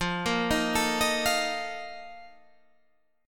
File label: Bb Major 7th Suspended 4th, F, chord